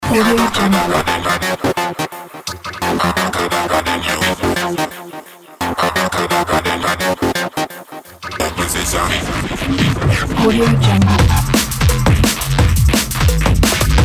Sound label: drum and bass straight